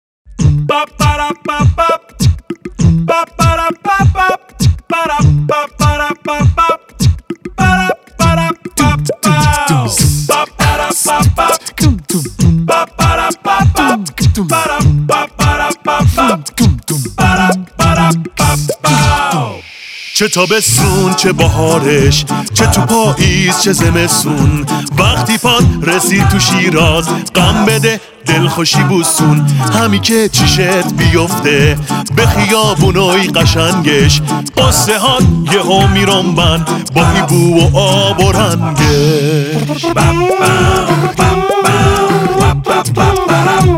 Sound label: Acapella